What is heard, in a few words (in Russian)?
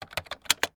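kompyuternaya klaviatura nepreryvno nazhimaet neskolko klavish dlya vvoda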